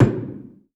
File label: Circle Stairs Wall Tom 1, Drum Hits; Aberdeen Music Hall; Music Hall Percussion